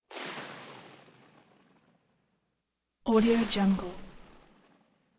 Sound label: synth jet by fast artillery like whistle